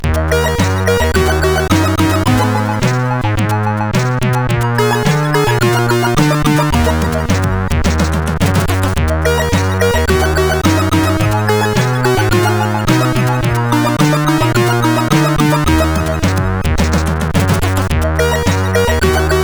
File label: sid CSG8580; Arizona 1